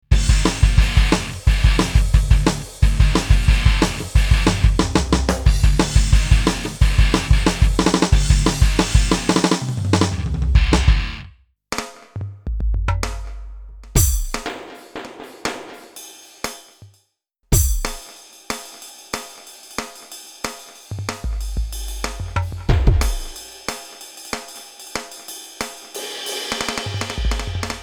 Test Alesis DM10 X Mesh Playalongs

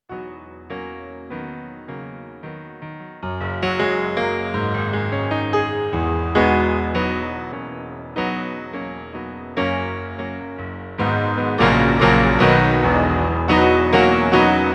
Piano plus link

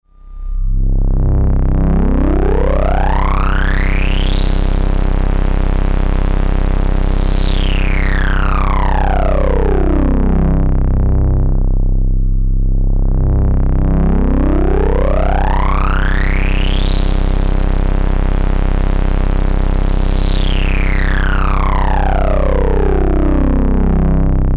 Synthesizers A1 Drone Filtersweep Dry

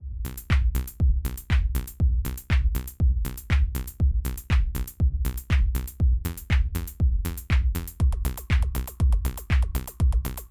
Original microTonic Drum; Soundtoys

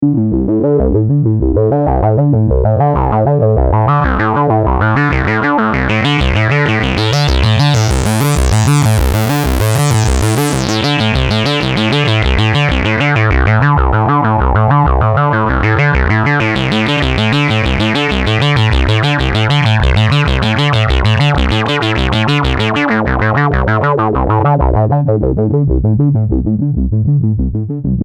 MicroBrute Saw Brute Seq

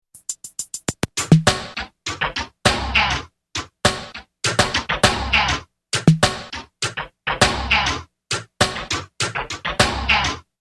Loop High Gain

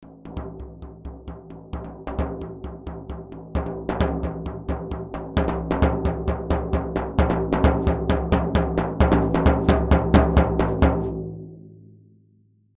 Multi Frame Drum Velocity

